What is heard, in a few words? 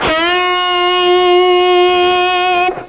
electric guitar; string instruments